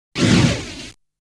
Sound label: Enemy Attack Sound; Saint Dragon